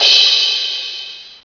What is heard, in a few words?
Heavy Crash (A3); Star Trek JR Audio